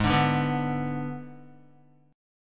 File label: Ab Diminished, Guitar; strummed; 3,5,2,4,2,x; 4F,4A,5C,5G,5C,6E